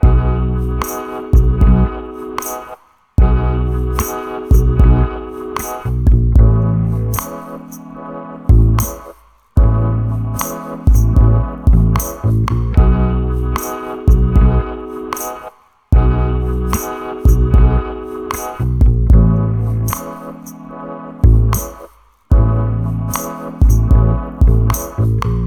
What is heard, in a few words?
Subway music; Berlin